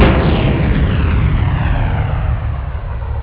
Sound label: base expl; MatrixHunter V1.1